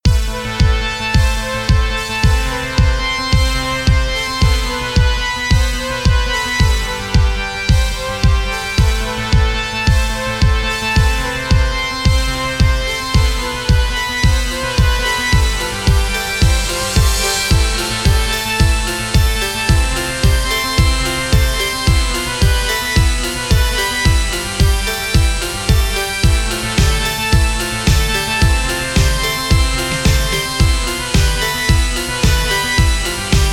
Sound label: vortex soundwaves